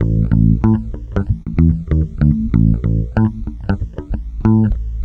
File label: BASS, BASS GTR